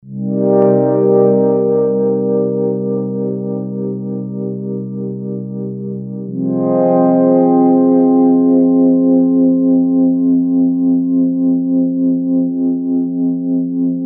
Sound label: Roland JP08 PAD